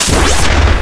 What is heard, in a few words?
shut